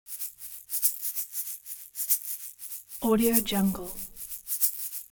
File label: eggshaker slow short; musical elements